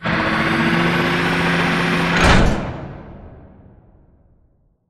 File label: Prop Warehouse Robot Arm Left Rotate; Titanfall 2; Boomtown